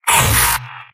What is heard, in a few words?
soldier pain severe; tf; mvm